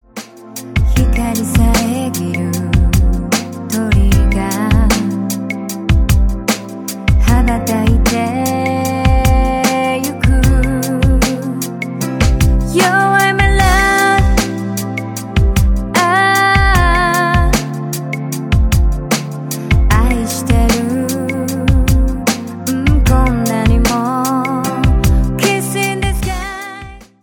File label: song sample